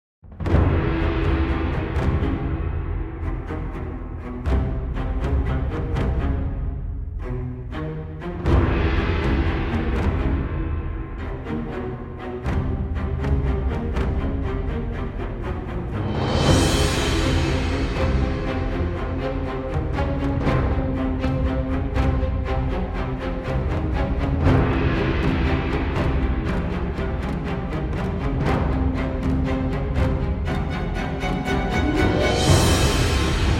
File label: Field BGM; Xenoblade Chronicles 3